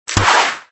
Arrow Release; Extra Lives

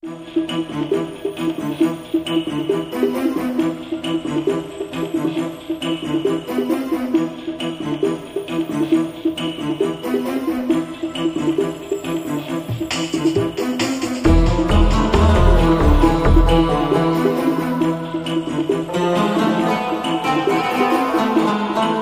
Soft; Will Rock; Greek

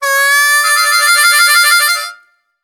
Harmonica

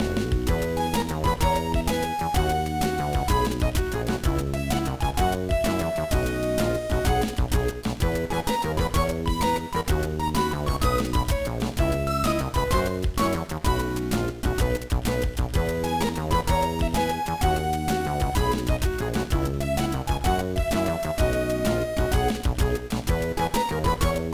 Result Announcement; Pokemon Sapphire; GBA

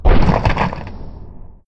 Pillar Breaking; lego ninjago rise of the snakes

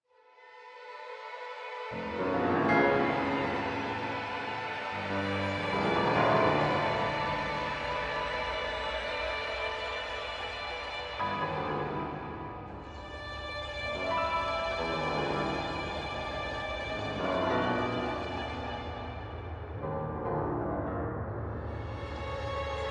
74 BPM

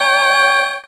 Throat Chop

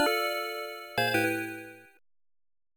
Music - Jingle

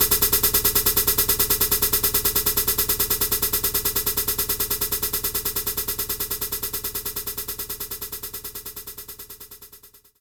Hi Hat Pedal

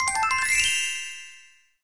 Item get

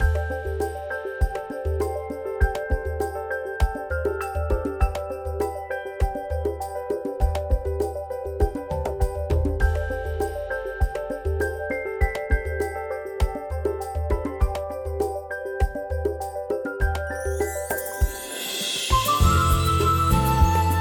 BGM Village; bellatia; GATA

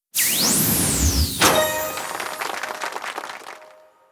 ui unlock skin

